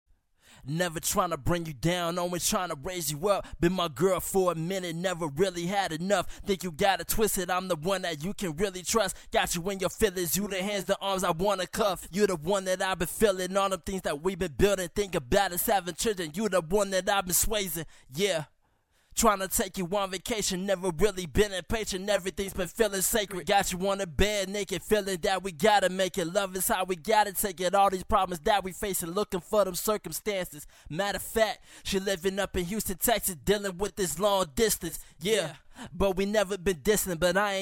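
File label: hip hop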